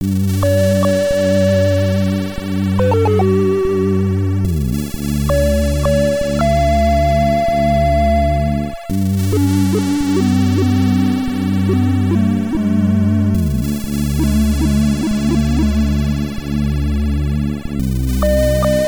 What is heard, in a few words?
Game Over, SID Stereo, commodore 64; Shadow of the Beast